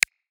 Menu Hover; Martian Chess Audio

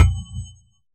impact Mining

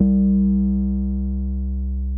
Drum BPM110; Martian Chess

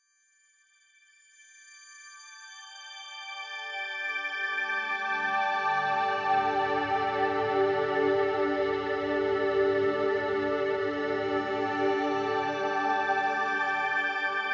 Chord Ramp D; Martian Chess Audio

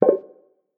Put Down Piece; Martian Chess Audio

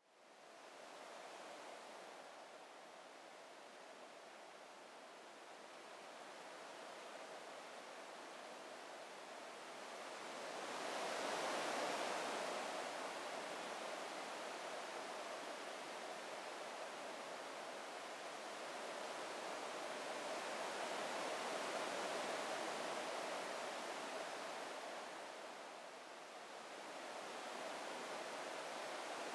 Wind; Ambient

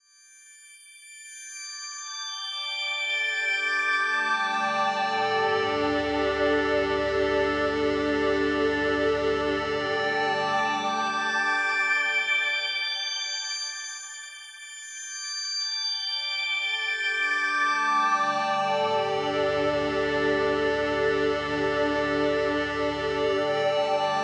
Chord Ramp D; Martian Chess Audio

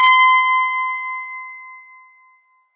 Dulcimer

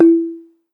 Water Bottle

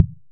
impact Wood heavy

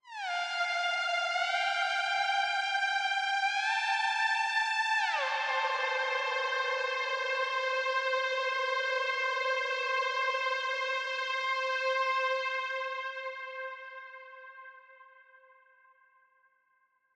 Lead Synth; Martian Chess Audio

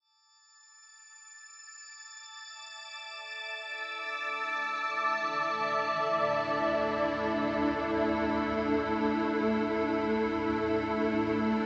Chord Ramp A; Martian Chess Audio

